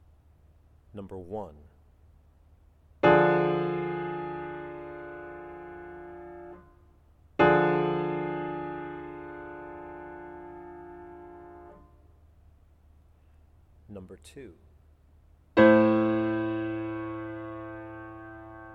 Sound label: chords